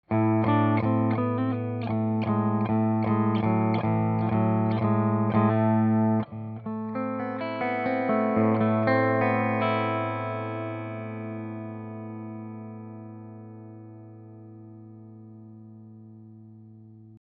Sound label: Bridge HB Clean